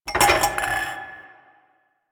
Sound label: Glasses Clink; Ambience; Warframe; The New War; Quests; Zariman